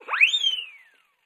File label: Horns 3 Various Comic